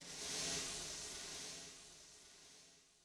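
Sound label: Brush Sweep, Yamaha DTXpress-II snare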